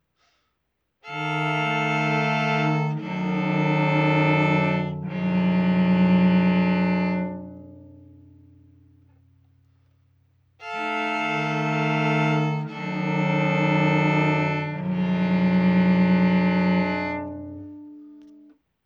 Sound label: golden ratio harmony